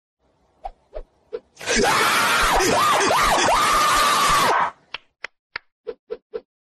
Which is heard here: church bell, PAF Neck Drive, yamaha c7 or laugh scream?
laugh scream